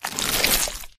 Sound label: human form short move; flood